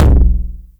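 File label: 909 low tom, tape saturation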